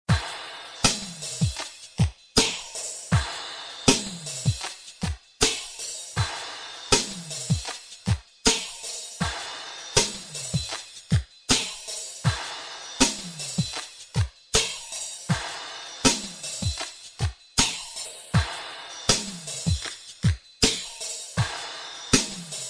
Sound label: drums